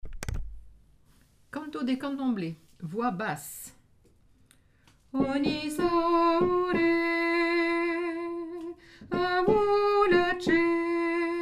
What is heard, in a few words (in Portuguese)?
canto de condomble voix basse